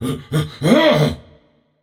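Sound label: non verbal fear, voice; cernunnos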